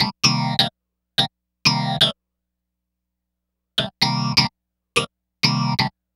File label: Clavinet; Dr. Fink's Funk Factory